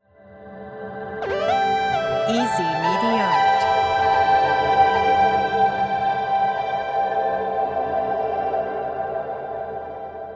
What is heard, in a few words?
message logo